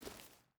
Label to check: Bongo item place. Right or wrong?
Wrong